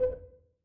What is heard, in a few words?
txting type caps; Steam; tenfoot